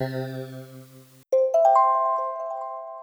stereo test; castle game engine

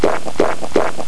player potion drink; simple 3d demo

